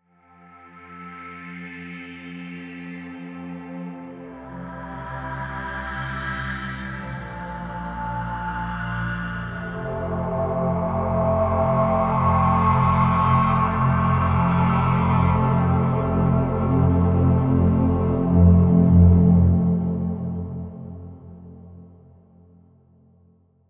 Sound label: Gravity Pad A